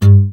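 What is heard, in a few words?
GUI Ac Dmp G, acoustic guitar; Best Service Gigapack 2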